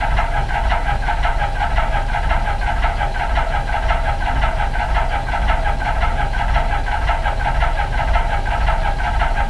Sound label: broken fan; ambience; aomdc